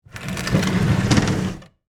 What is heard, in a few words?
Wood Crate Move, Foley, Wood, Crate, Movement, Slide, Large, Box; BlastWave